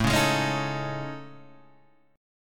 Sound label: A Major 7th Flat 5th, down Guitar DADGAD